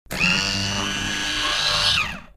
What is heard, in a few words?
Toy car radio controlled movements